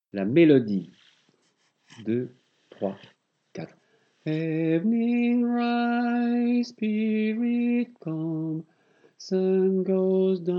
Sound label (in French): melodie, chorale